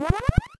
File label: jump